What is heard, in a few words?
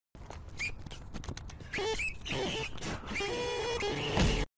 default settings sound